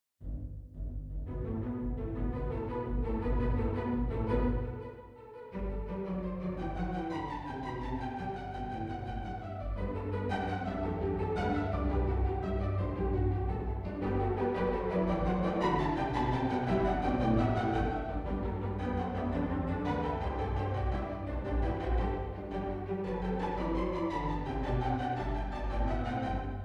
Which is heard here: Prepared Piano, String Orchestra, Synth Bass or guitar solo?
String Orchestra